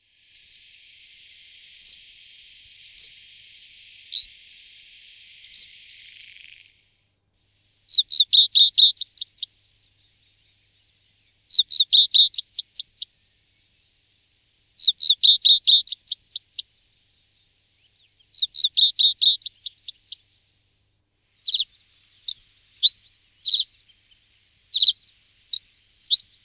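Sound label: Emberiza schoeniclus, passeriformes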